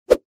Weapon Sword Whip

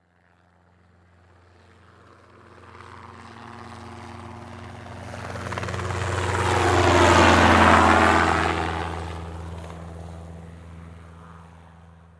Transportation Helicopter Fly By

